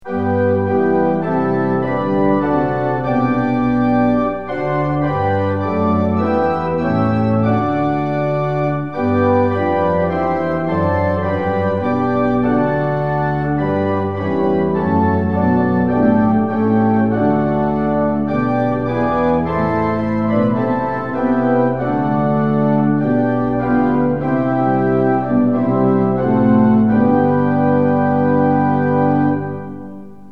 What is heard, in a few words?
tune; hymns; lillenas